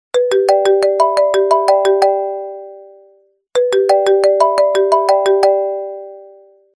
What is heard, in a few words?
iphone